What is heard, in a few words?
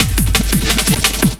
loop, 170 BPM, drum & bass